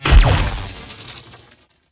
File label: Explosion Lightning